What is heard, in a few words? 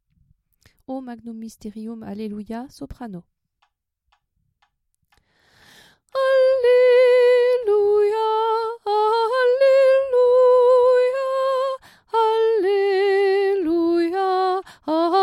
O Magnum Mysterium Alleluia Soprano